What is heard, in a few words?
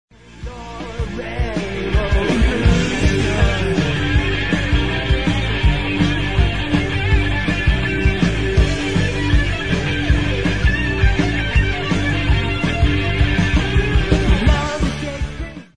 Gtr Solo